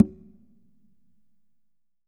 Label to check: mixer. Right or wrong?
Wrong